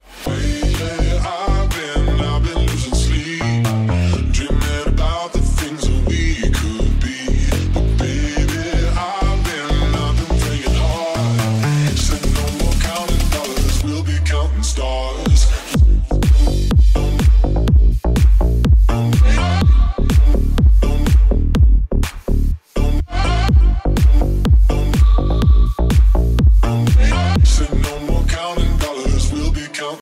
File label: Remix